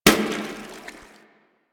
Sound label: Water Balloon Impact; Warframe; Water Balloons